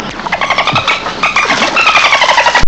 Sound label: flipper